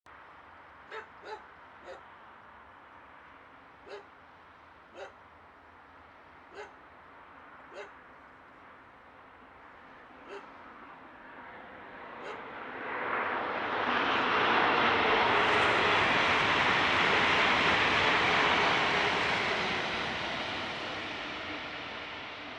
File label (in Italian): Treno mono; Eigenmike; A-weighted; Nuova Strada